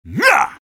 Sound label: sniper taunt flip exert